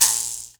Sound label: MOUTH BOW, Heart of Africa